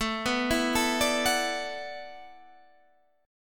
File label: E 9th Suspended 4th A, guitar